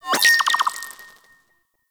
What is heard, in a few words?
Dynamic Mission Marker; DE; Warframe; Objective Markers